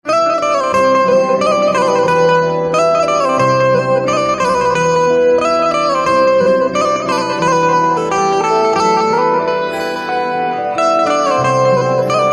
Banjo Instrumental Ringtone

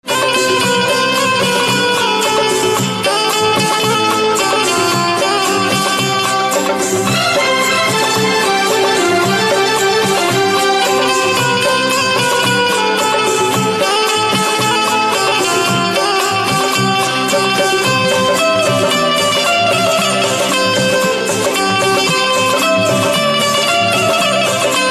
Banjo Instrumental Ringtone

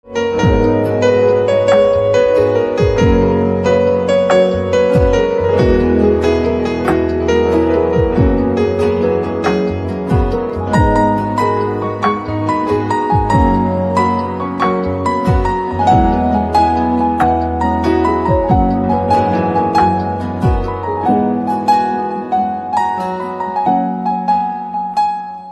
Piano Instrumental Ringtone

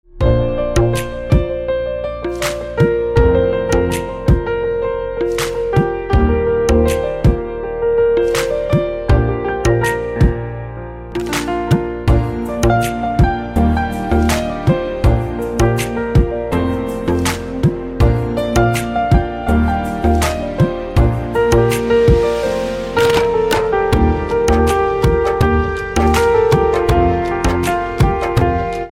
Piano Instrumental Ringtone

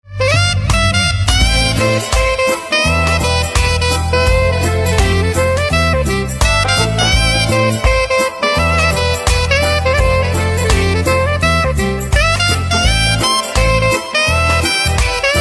Saxophone Instrumental Ringtone

